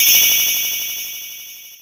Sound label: goal post; sonic 1